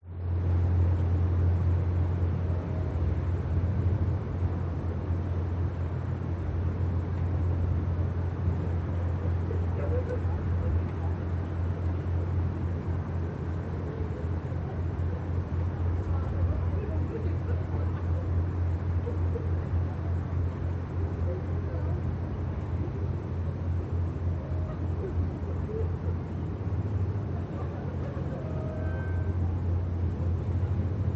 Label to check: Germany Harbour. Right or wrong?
Right